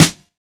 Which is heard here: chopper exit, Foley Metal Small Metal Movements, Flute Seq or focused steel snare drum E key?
focused steel snare drum E key